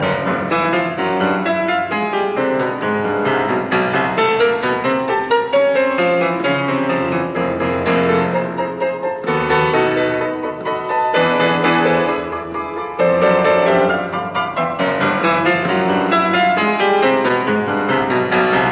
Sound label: Variations in C major on a Waltz; 33 Variations; Op 120; Allegro